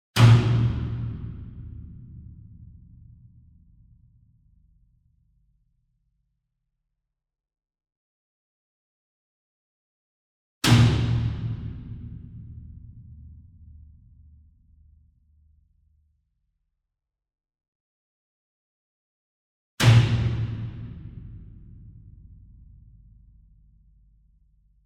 SINGLE DRUM Bass Drum Concert 40 Inch Hit Whip Beater Far; boom library; Cinematic Strikes Construction Kit